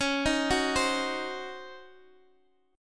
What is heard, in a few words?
C sharp Major 7th Suspended 2nd Suspended 4th, Guitar, DADGAD; C#M7sus2sus4; arpeggiated